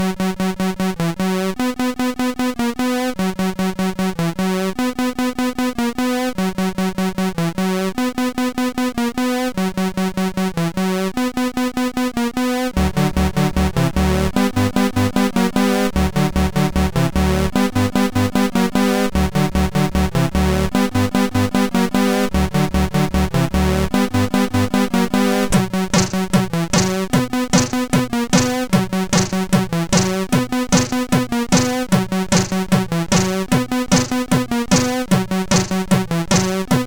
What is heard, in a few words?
CSG8580; Square Scape 2